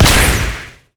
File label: carpet bomb; rumble skin03